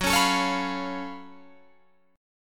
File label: Gb Minor Major 11th Gb down Guitar Drop D